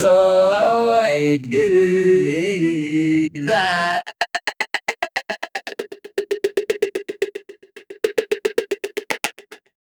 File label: weird twisted vocal chop; experimental